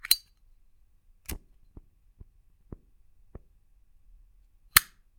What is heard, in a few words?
LIGHTER; 1000fx